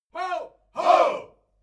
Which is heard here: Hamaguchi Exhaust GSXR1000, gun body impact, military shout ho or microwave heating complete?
military shout ho